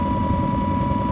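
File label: construction site crane loop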